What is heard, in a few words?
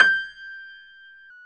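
piano, grand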